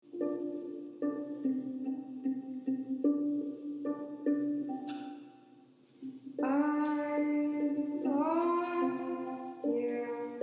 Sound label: country music